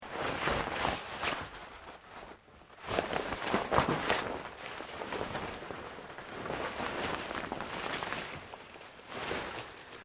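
JACKET SKI PUT ON, Movemement sounds for various articles of clothing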